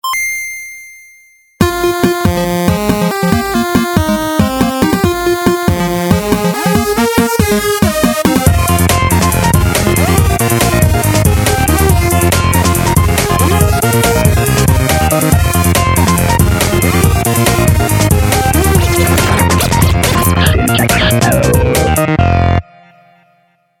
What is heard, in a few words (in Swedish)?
Jingel; Dentoid; Syntax Error